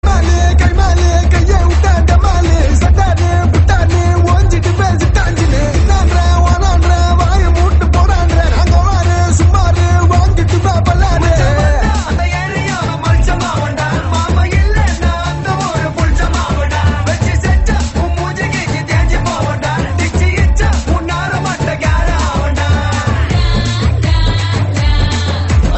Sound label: Tamil romantic ringtone